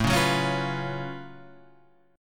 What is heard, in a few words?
F sharp Minor Major 11th A